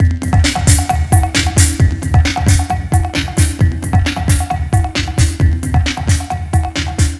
Idm, Drums, Beats

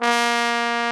trombone; Solo Brass

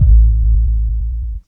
Sub, drum

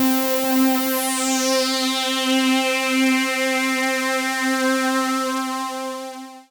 PAD, DIGI-PAD

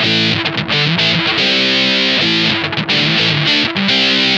BIG RIFF, Funk Guitar; Zero G - Funk Guitar

